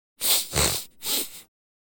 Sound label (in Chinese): 擤鼻涕